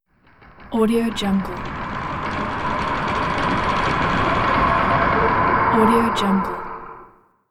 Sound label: spooky roller blind; marburymedia